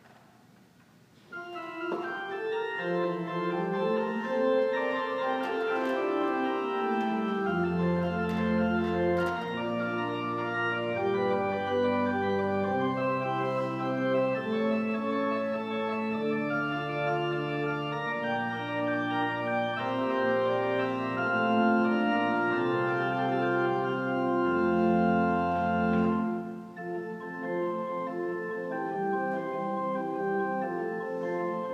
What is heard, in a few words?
prelude; Organist; Prelude in F from Eight Little Preludes and Fugues – formerly attributed to J. S. Bach (Germany: 1685-1750)